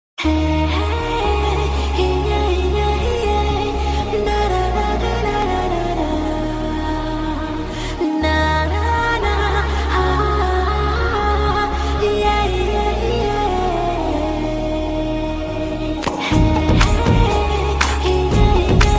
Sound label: humming ringtone; paiya